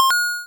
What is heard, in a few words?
Retro PickUp Coin; FreeSFX; GameSFX